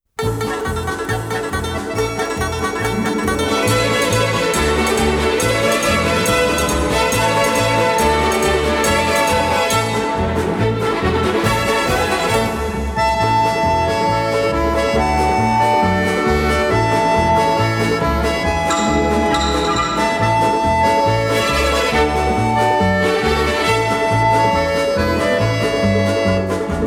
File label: Hoedown